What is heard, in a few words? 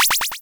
popup incoming; yumpod